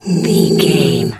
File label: bear pain whimper